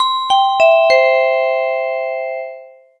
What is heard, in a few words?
vibraphone